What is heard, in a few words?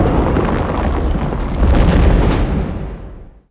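close door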